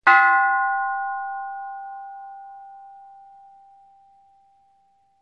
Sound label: dongdong